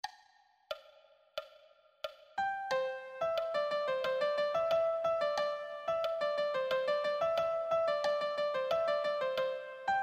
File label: lead, piano